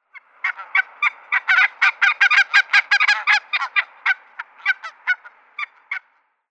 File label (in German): Weisswangengans Branta leucopsis; Vogelstimmen